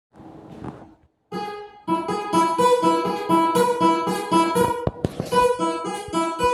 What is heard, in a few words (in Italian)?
chitarra